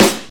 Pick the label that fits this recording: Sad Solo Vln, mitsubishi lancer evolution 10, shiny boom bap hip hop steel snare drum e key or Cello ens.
shiny boom bap hip hop steel snare drum e key